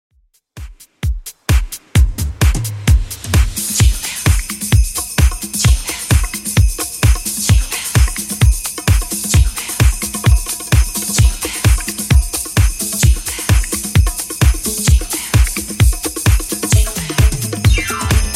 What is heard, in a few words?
Christmas Redrum 130bpm